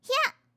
swing, fighting

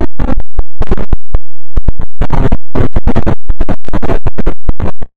Retro Interferences

